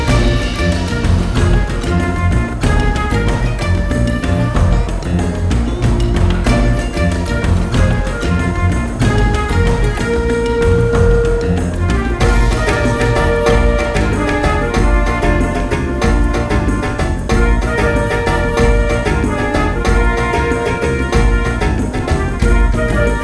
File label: Musical Rock; zoo tycoon 2